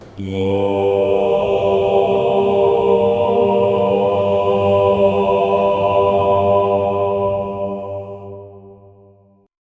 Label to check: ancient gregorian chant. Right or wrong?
Right